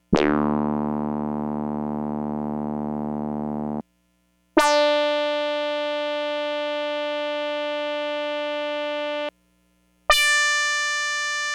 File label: CS80 Funky; Xils